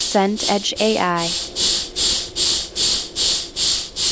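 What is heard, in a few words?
ambience church interior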